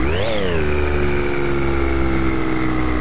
Motor Jump; Teen Titans go! H.I.V.E. 5 Robin Vs See-More

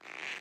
sfx roll dough; Dorothy And The Wizard Of Oz - Cookie Magic

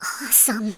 idle, Truck; Nickelodeon - Destruction Truck Derby

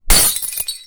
light bulb breaking; Dark Village

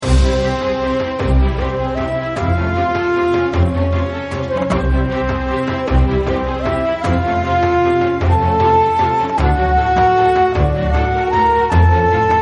title Music; Legends of the Hidden Temple - Unlock the Past

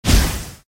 scenario cannons; Adventure Time - Break The Worm; ThrillGames

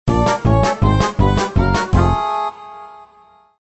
lose music; Looney Tunes - Avalanche Snowboarding

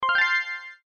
item pickup; Adventure Time - Elemental; ThrillGames

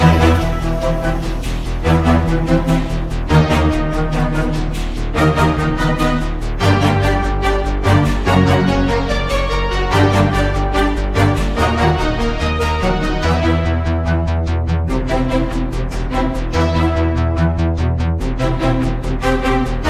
music lab; Henry Danger - Day Of Danger!

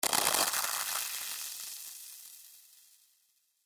freeze; Keep Out! A Dungeon Adventure for the Brave

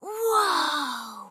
rescue generic friend; run-dorothy

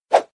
attack; Legends of the Hidden Temple - Unlock the Past

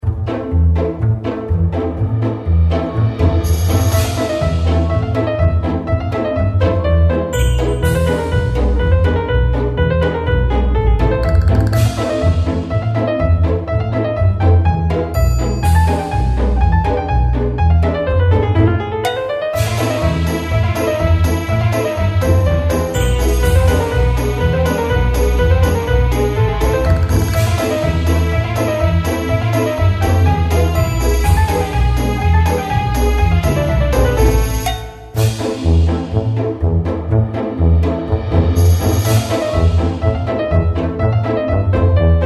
BGM Main Menu; Bunnicula - Crop Control

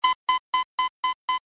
time running out; Danger Mouse - Ultimate

